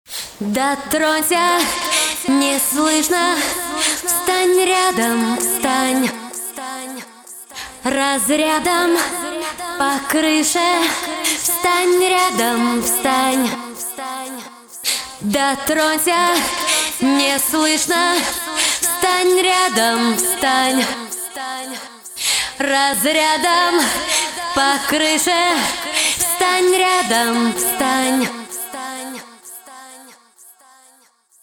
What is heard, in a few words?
Acapella